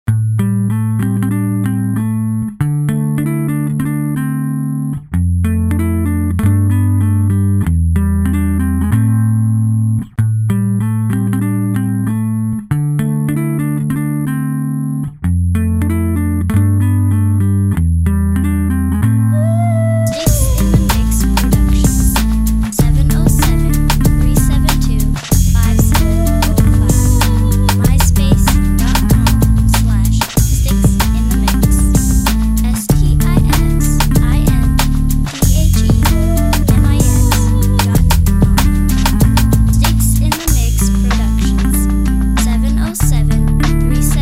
eight bit guitar

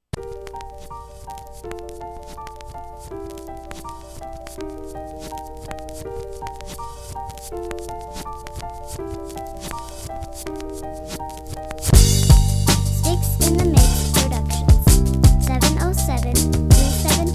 piano solo soul

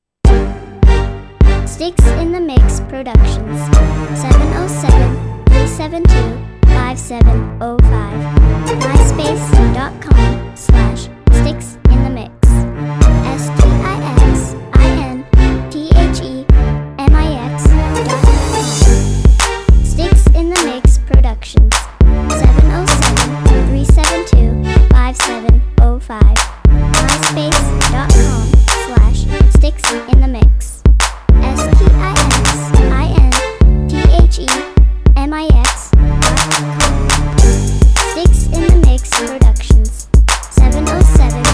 classical

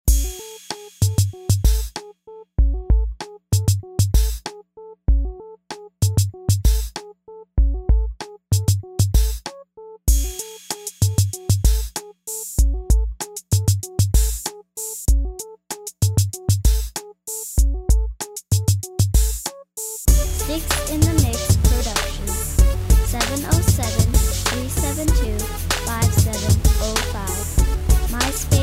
splash hyphy

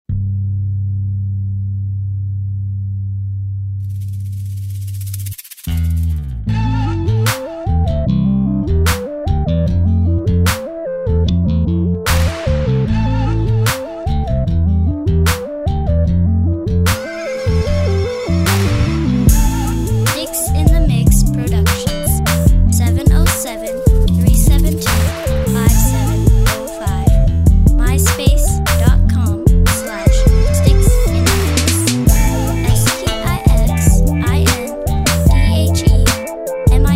southern bass guitar

